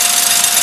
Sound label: machine gun spin; tf2